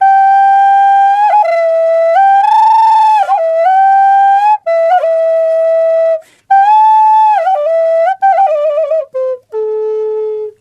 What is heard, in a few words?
A WILD, FLUTE WILD; Big Fish Audio - Didgeridoo and Other Primitive Instruments